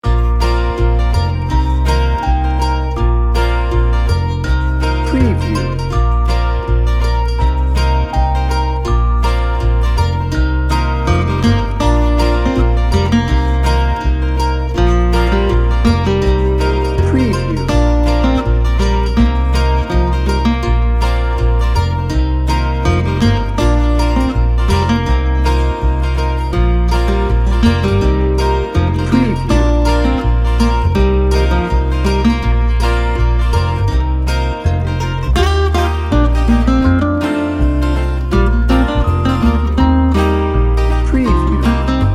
rustic heartland no drums

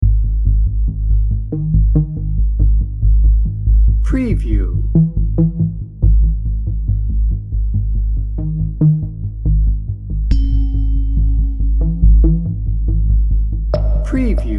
pulsing tension drone

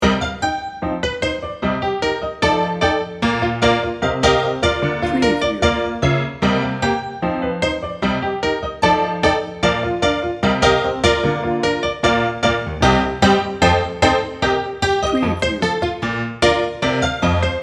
silent movie rag

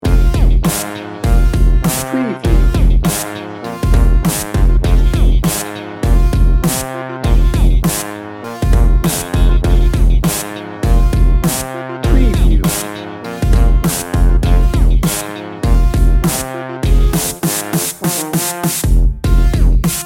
new orleans street party no hi horns

